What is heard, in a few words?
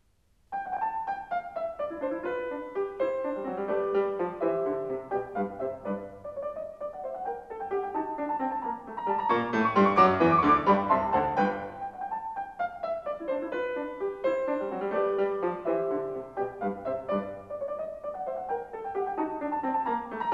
Piano Sonata No. 3 in C major; Wilhelm Kempff; Beethoven; Piano Sonatas